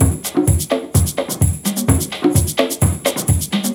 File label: Latin House Loop 128 BPM; Vengeance Samples